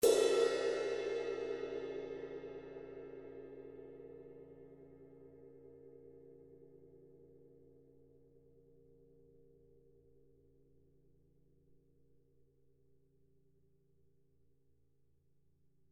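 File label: RD ZXT 20 CTR 20, ride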